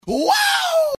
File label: woooo